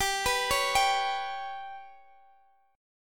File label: G Major Flat 5th G down Guitar Drop D